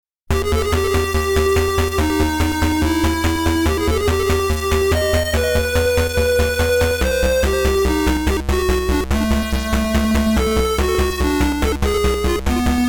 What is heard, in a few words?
bgm (PSG); Aleste; MSX